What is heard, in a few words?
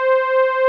ANAPAD C5, ANALOG PAD; Music Store - Claudius Diemeter Schwerlos